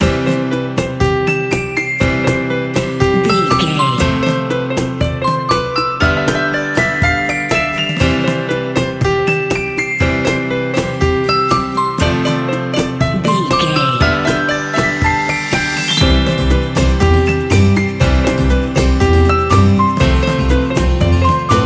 fun cheerful happy bright children play short loop